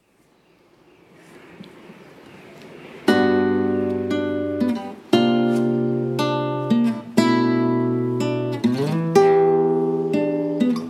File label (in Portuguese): samba